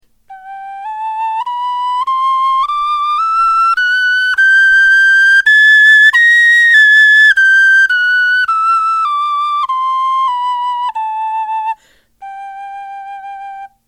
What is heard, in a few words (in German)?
tonleiter